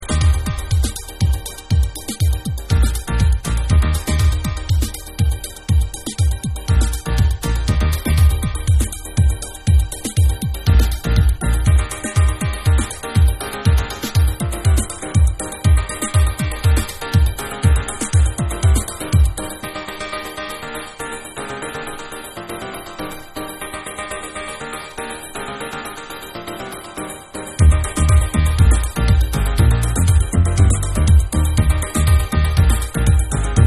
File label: CHIME